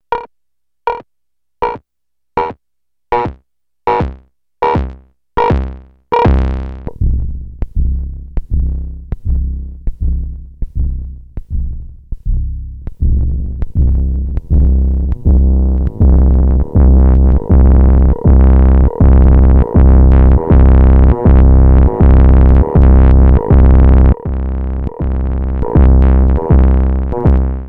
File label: perc adsr, novation bass station 2; percussion decay; analog monophonic machine with subtractive synthesis